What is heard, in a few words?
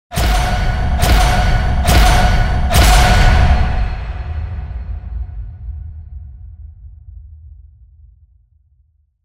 Production Music Trailer Tools Buildup; CATastrophic